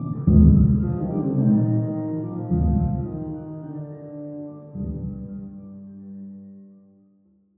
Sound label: villian foreshadowing